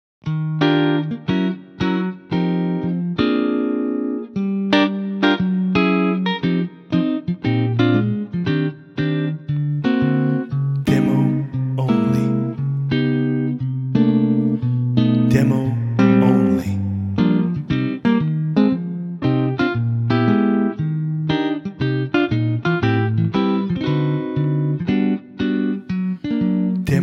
Eb sunburst guitar

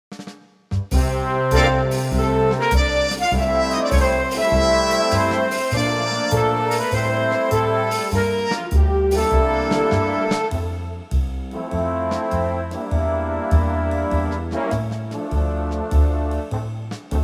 big band F

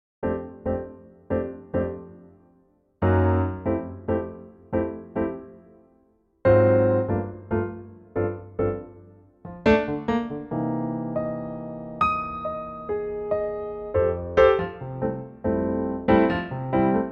F piano

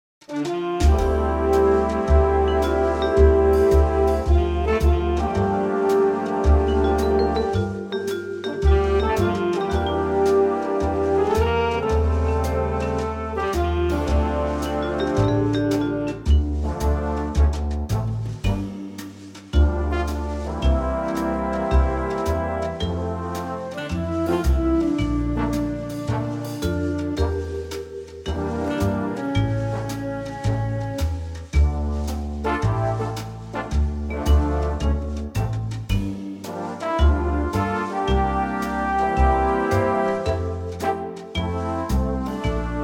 Dm Big Band

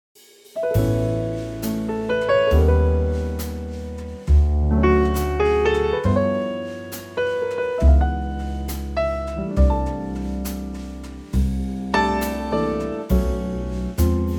A Trio

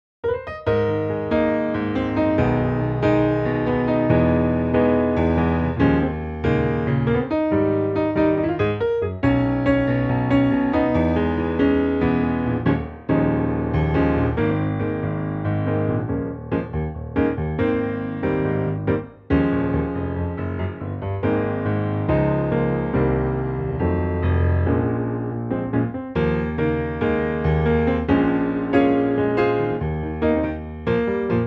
piano Ab

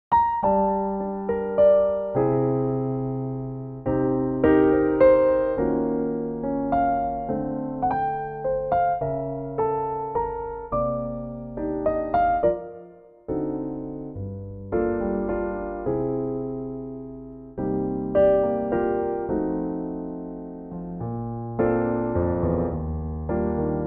Bb Piano, female

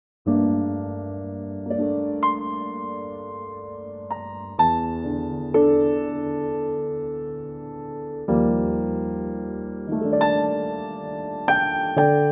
Bb piano

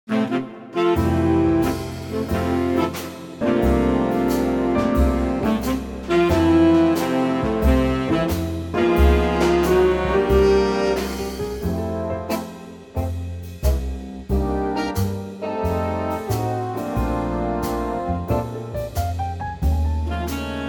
F ballad Big Band, female

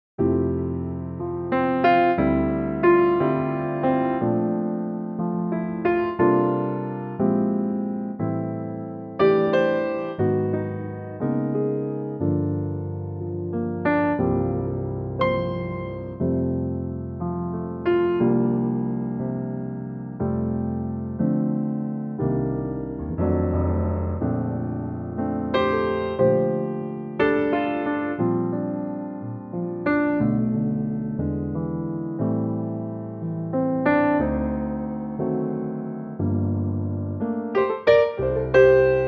piano F

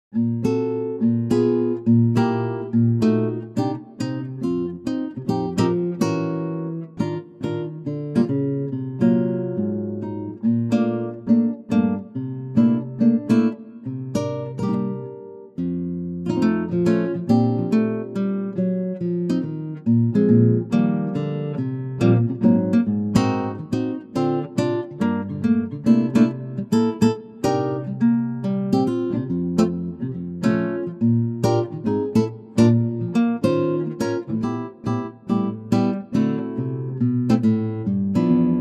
Ac Guitar Bb

